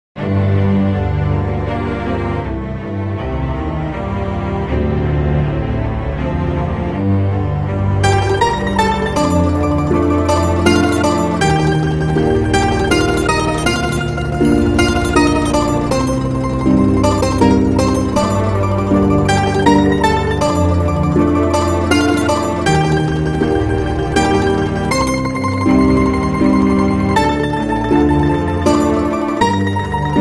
Mandolin Strings Harp; Banjodoline; Chordophonet; Syntheway

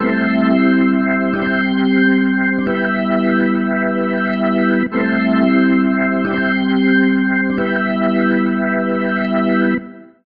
Rotary Speaker Spinning Motion Organ Dry; AmpVibe; Syntheway

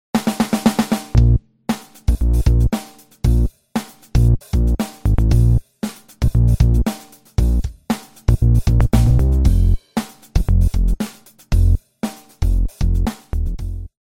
BASS Fat Bass; Synthractive; syntheway

